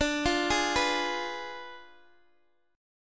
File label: D Diminished 7th, Guitar, Open D